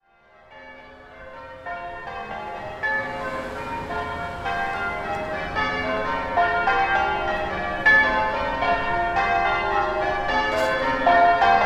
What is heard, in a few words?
Wedding Bells; Port Sunlight